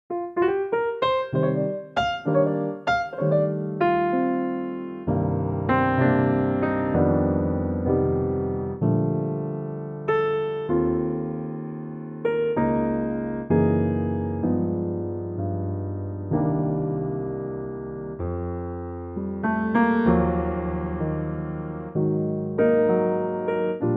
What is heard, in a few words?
Bb piano